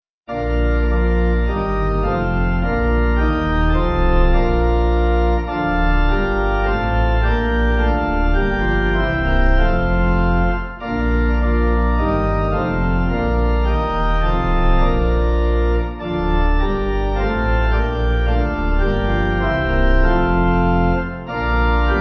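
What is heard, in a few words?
Pipe, Pipe Organ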